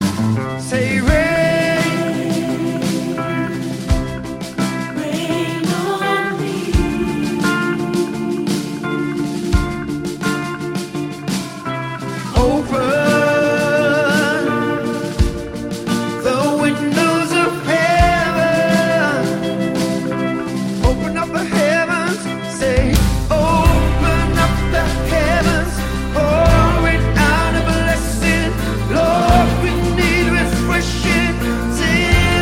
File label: rain live